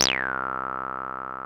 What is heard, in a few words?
303 SAW; CMP - Analogon